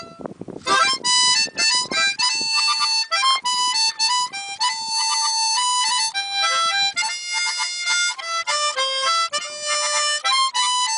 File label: Harmonica